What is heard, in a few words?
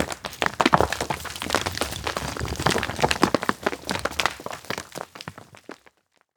rocks fall